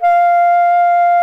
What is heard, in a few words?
F4B, tarogato; SoundBytes - Early Patches